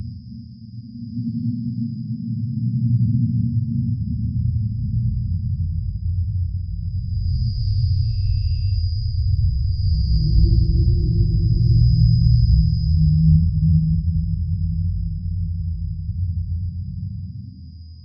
ambient of a dark, bats

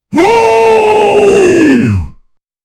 human battle cry sounds, bass heavy

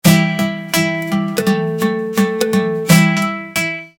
i need a song jingle

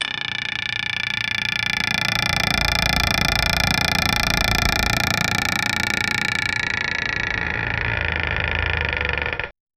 generate a slow eerie doo, haunted house

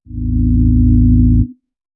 style genre futuristic, intelligent

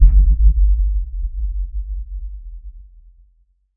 cinematic sub hit

